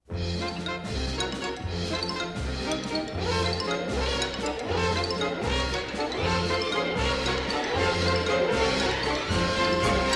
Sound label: strings orchestra